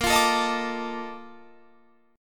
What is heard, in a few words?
Bb Major 11th Bb down Guitar Standard; strummed; JGuitar